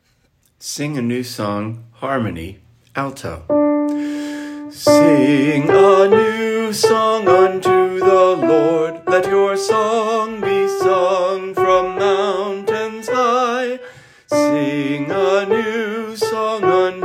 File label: Sing a new song alto and harmony; St. Anthony of Padua - Choir Sunday